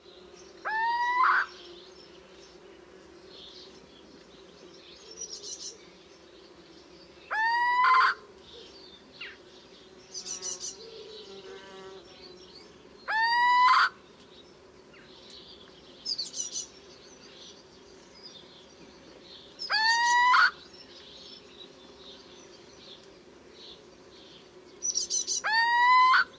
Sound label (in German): steinkauz typisch